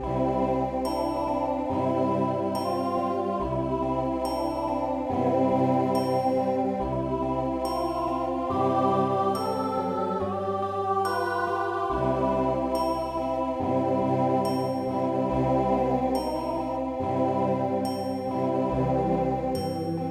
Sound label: frog forest